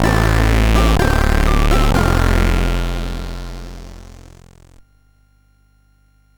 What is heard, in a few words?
CSG8580